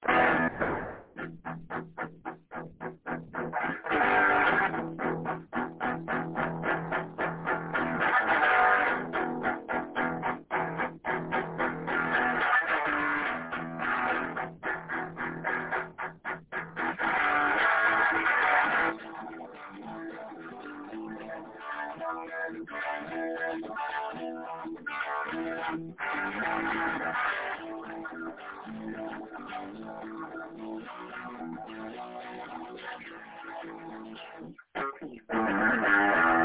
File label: running down the mountain chords